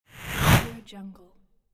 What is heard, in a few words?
8bit woosh; loopbox